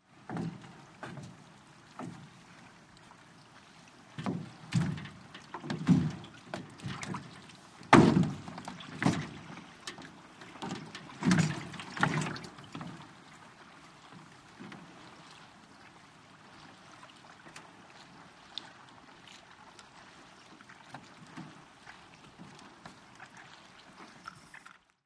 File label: SPORTS FISHING: EXT: Fish movement in boat, lake